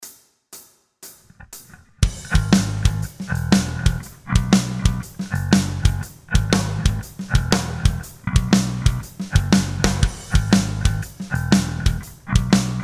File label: Backing Track; rock guitar mastery